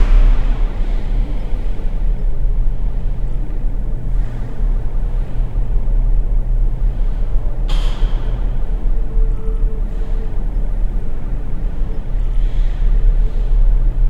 ambience inside a hallway; airship

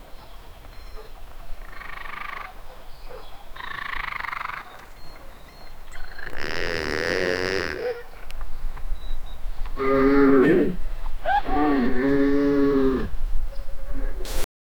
the leopard chases after, aggressive

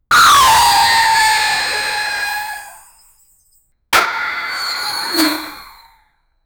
snowball crashes into the, village

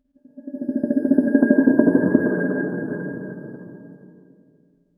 stone rolling away, mystical

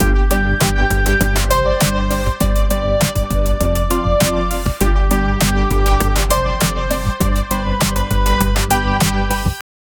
a disco loop in G

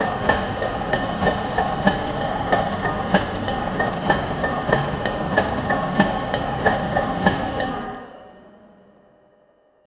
mechanical rhythms, steampunk

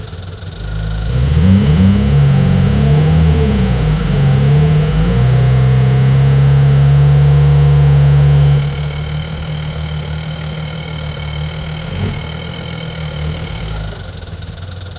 car engine working v8 lou

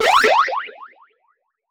gba sound effect retro; pokemon

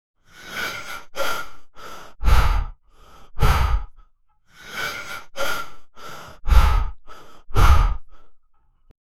very heavy breathing; survival

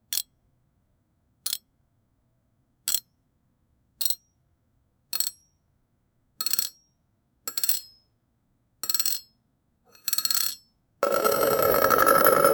a rolling catherine wheel

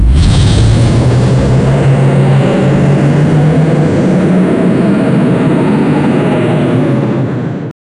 rocket ignition crowd mur; events

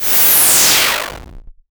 futuristic energy dischar, laser

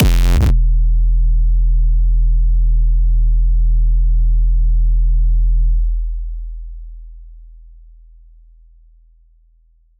kick drum, techno

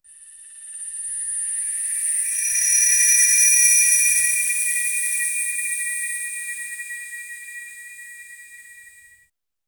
generate a soft delicate, brush stroke

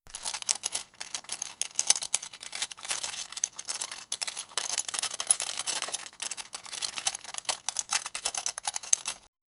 sound, money

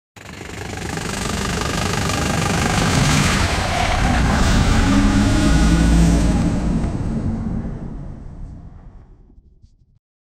sound effects rolling big

